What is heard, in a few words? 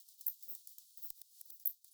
Pipistrellus kuhlii, batcalls, europe